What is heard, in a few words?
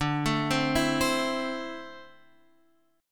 C 6th D, C6/D chord; arpeggiated